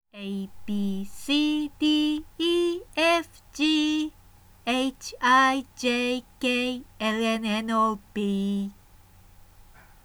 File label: abc song fast